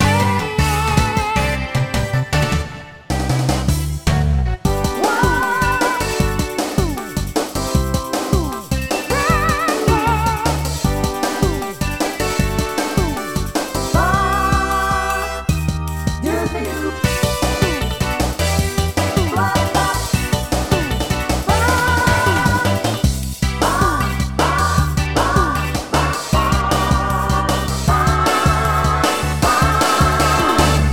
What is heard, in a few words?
Arcade Menu; Chuck E. Cheese Party Games; Wii